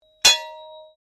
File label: Blade Heavy Moving Impact Ring, Sword; Foley sounds for melee weapons; Combat